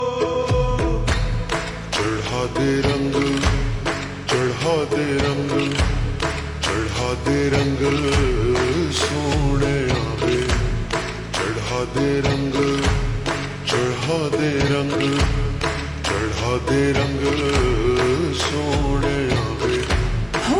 Ringtone Slowed Reverb

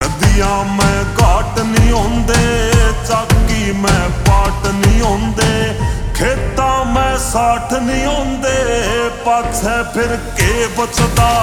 Ringtone Slowed Reverb

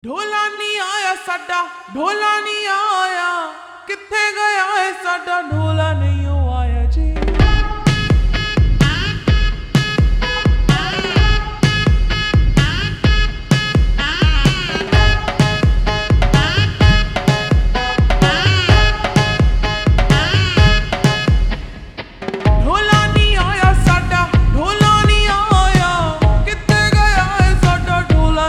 Ringtone Slowed Reverb

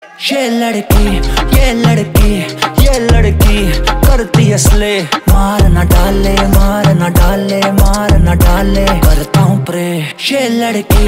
Ringtone

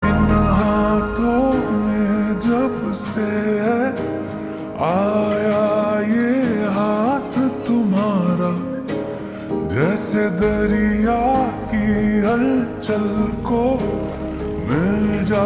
Slowed Reverb Ringtone

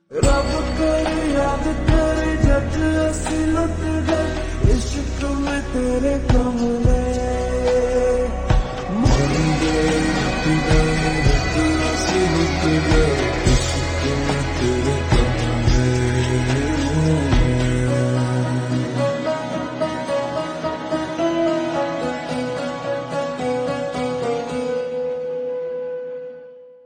Slowed Reverb Ringtone

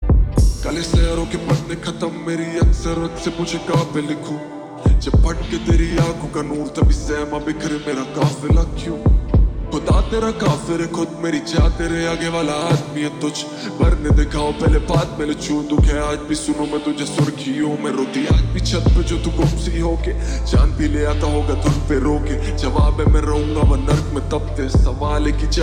Ringtone Slowed Reverb

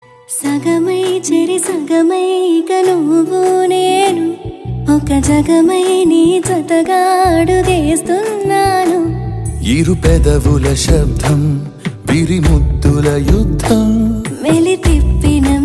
Love Song Ringtone

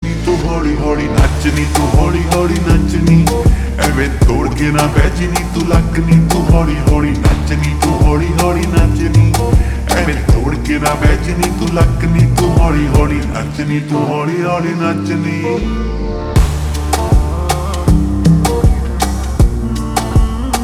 Ringtone Slowed Reverb; khel khel mein

